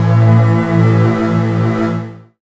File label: Industrial, Pads; Jungle Warfare 1&2